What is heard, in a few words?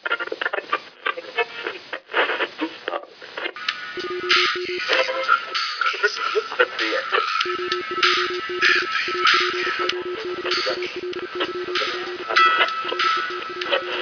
radio